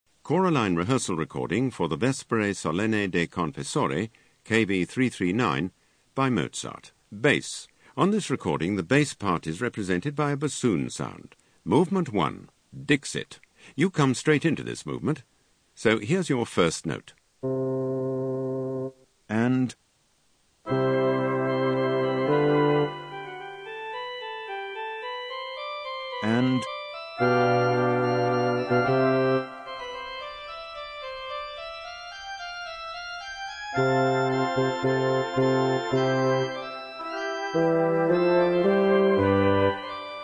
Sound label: Bass; Mozart Vespers